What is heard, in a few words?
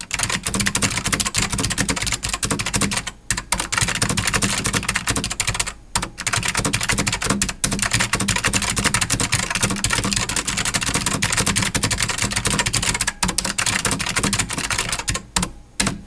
Matias Tactile Pro; Xmas-KB-samples